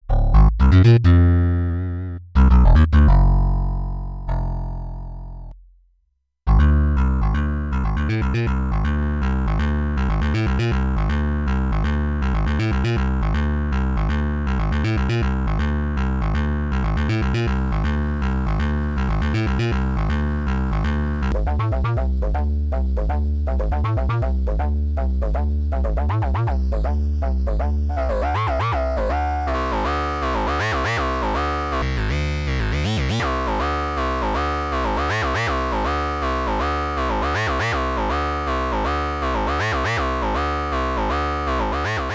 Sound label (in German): Aodyo Anima Phy Physical Modelling Synth Parameter Tweaking Bassgitarre